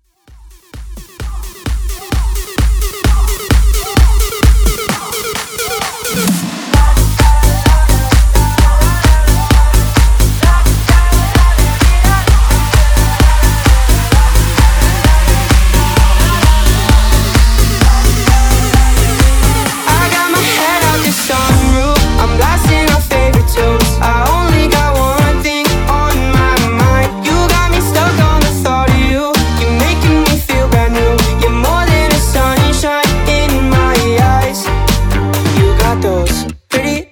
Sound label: Bootleg Bounce Smashup